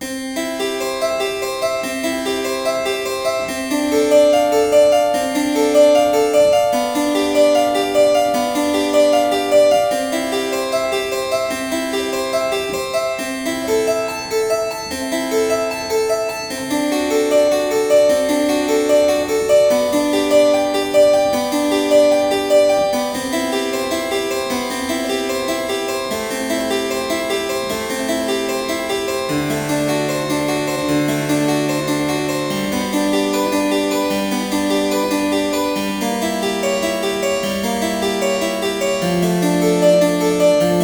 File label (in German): Werckmeister C-Dur